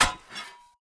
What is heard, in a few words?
2handed sword strike muted; Rust